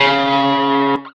electric guitar

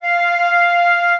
flute

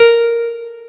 piano

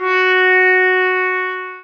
sax

